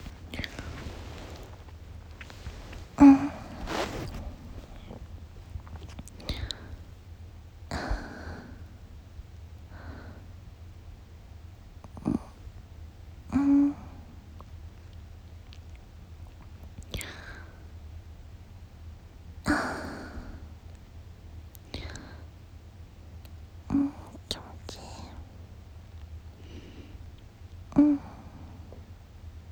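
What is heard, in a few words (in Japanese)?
現役JD おなにー, 演技なしオナニー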